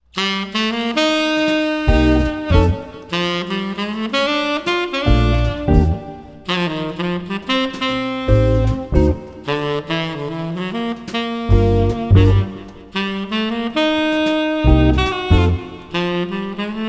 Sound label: jazz; elysium jazz